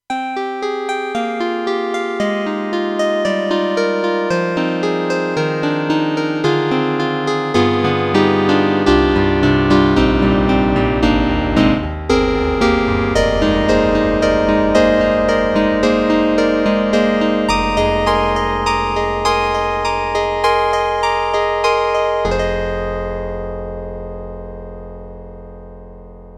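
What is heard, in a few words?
YAMAHA TG300 Acoustic Piano 2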